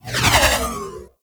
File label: Ball Projectile By; Warframe; Tenno Ball; Missions